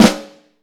FLAM, Vintage Snare Drum; Q Up Arts; Johnny.C's Vintage Snare Drum; BLK BEAUT

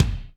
Kik Big Foot, Alesis D4, Drum Machines

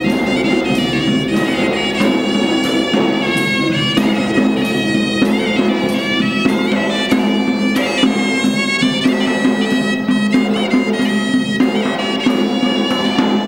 MIX, India; Best Service; Ethnic Journey Sound Cube

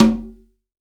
CONGA, STEREO CONGA

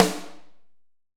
HALL SN, HALL SNARE

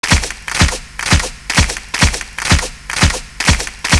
SOME CLAPS; Univers Sons - Kitch 70s

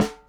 Bootknocker, Snares; Airwindows; BeyondSnares

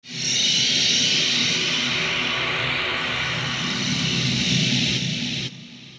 Noise Sting; cinematic drama; Stings; musicradar

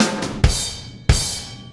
Combo Particle; Processed Drumkits Zero Gravity Beats

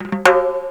TIMBALS; Ragga & Dub Reggae

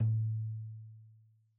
Tom2 Stereo, Deeply Sampled Drums

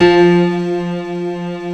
MIDI PNO, PIANO; East West; Global Sounds

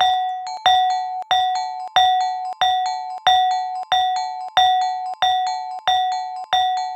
Saron 138 C#, Percussion Elements

